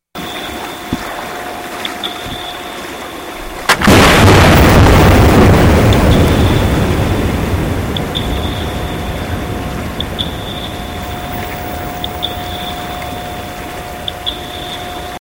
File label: seismic blast